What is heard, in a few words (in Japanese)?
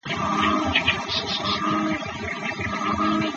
usigaeru